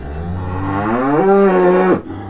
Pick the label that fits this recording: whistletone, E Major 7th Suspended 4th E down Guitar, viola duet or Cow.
Cow